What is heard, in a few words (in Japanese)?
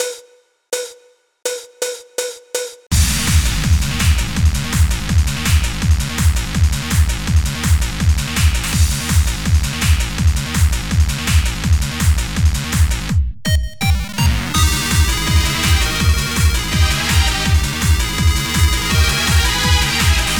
bansou